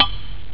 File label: crazy metal block; ST-XX Amiga Soundtracker Sample Packs